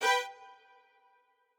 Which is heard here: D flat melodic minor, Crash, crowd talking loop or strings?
strings